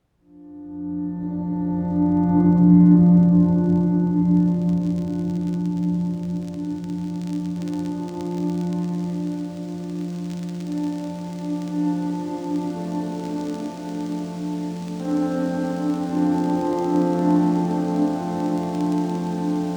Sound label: Piano Texture